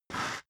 step boots concrete grit slide short; Footsteps; schritte

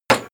impact metal bright; maschinen; soundpool

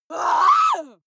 female lunatic scream short

